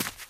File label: dirt, footsteps, run; player; source engine; left 4 dead 2; witch